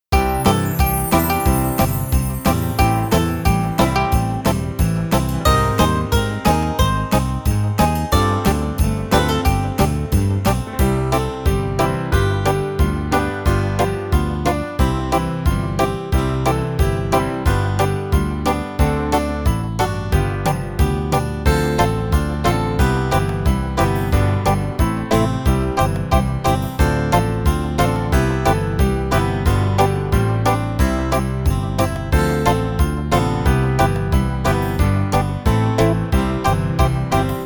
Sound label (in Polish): jada sanie instr